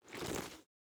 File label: cloth; gmod; arccw uc commons attachments